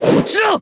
bloody punch; kurogane

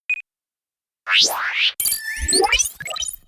matrix start; athena

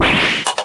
plate surprised

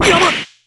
block; kurogane

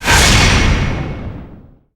furious; dhurke

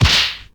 smack

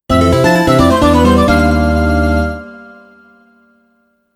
jingle

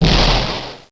damage

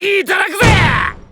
desk slam; terumi

